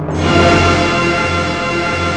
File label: CLASS.HIT; East West; Global Sounds